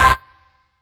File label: Dance Mega Synth; Best Service; 90 s Sample CDs